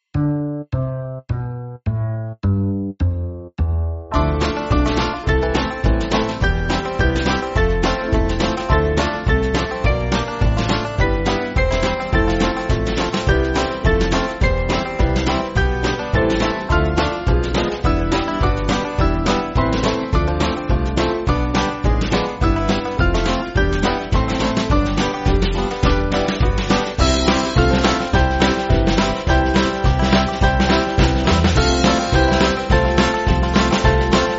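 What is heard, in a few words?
Band, Music Backing; God Is Love; Theodoric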